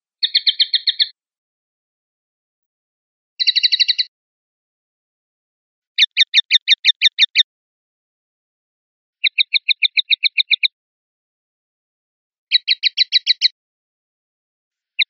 tateos, pardillo

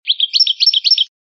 llamada de paillo, campero

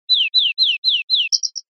chinchines, verderon

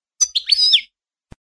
chipicolios, campero

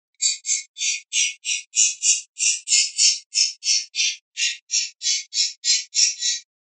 rep cosqueos, pardillo

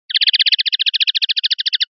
rep torreos, pardillo

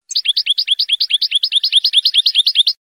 floreo tobi tobi; campero

